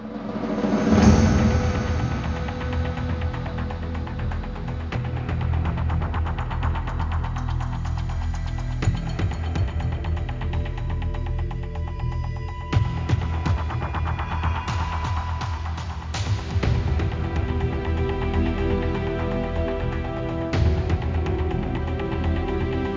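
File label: background music Danger; NCIS 3D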